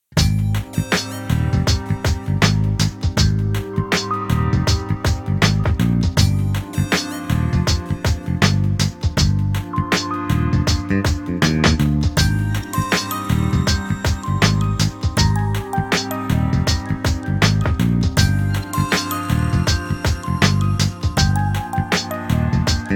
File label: casual